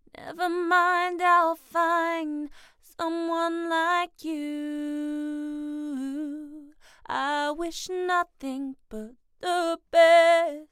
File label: U87 vocal